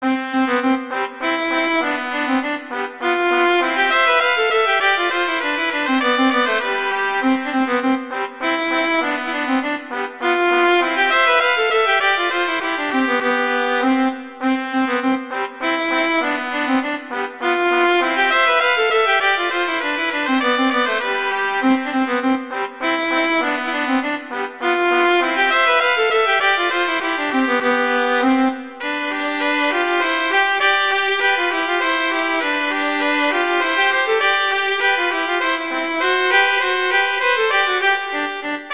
Duet; Mozart; Duets

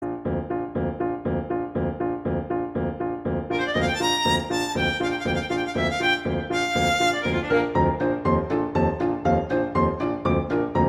Chinese Dance; Tchaikovsky; Nutcracker

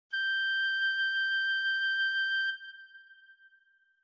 high Pitch; basic music principles; music and music notes

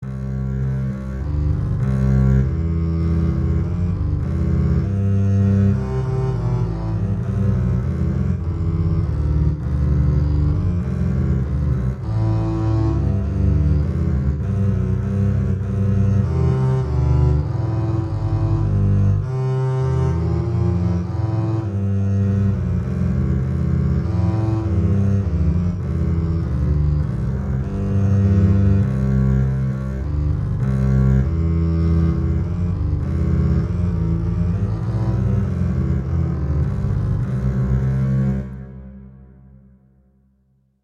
Duet; Mozart Duets

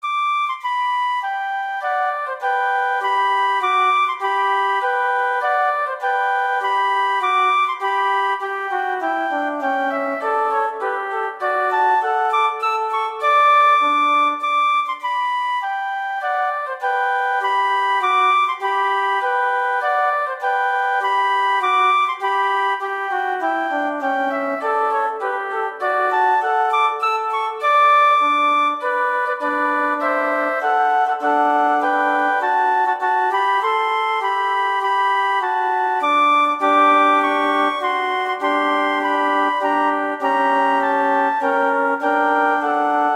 Duet; Mozart; Duets

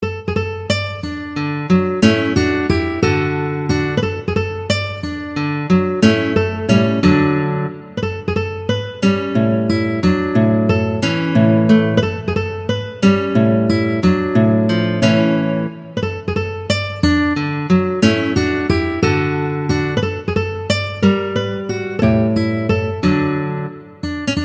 Waltz; Aguado; Waltzes

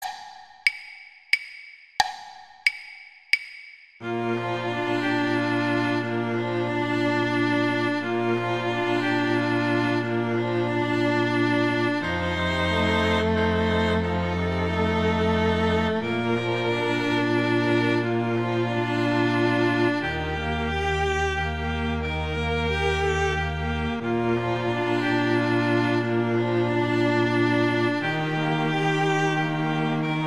Violin II, String; Gruber; Silent Night

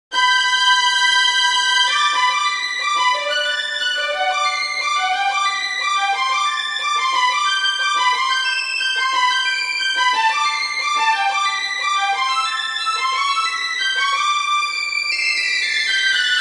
arpeges; Variation II; Wieniawski; Ecole Moderne

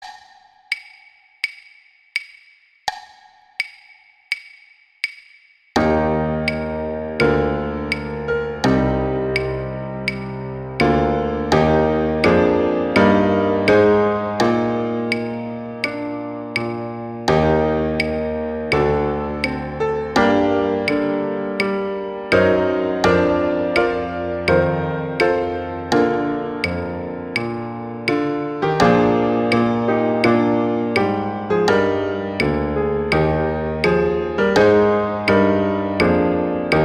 O Canada, sax; Lavallee

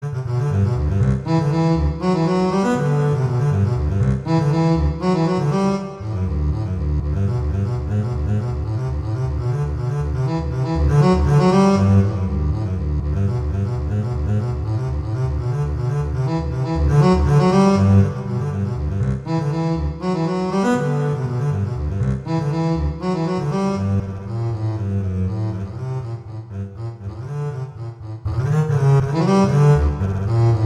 Waltz; Dragonetti; Waltzes

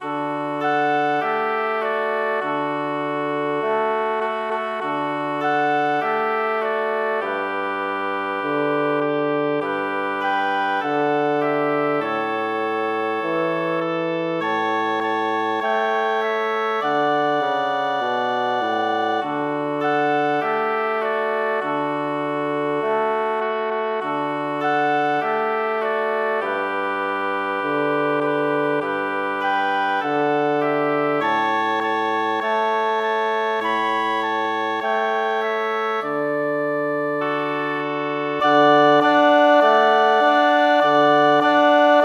Jingle Bells, Christmas

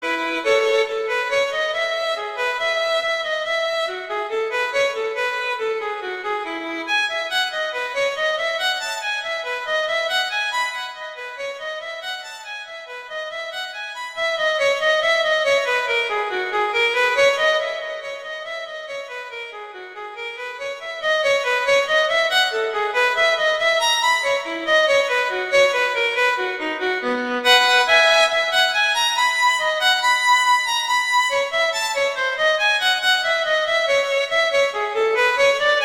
Bourree; Bach; Partita

